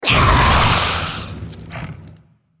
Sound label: Dragon dying; Shyvana